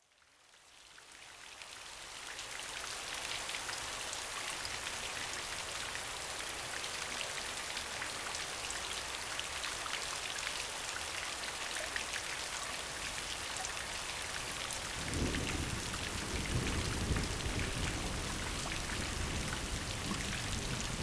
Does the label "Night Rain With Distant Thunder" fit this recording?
Yes